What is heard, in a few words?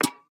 Peg Leg Footstep